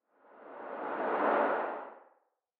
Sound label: Soft Metal Whoosh Ebb And Flow